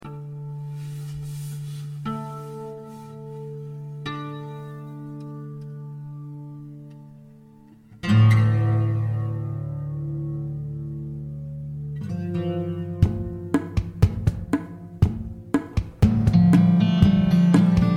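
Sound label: D 120 Acoustic guitar groove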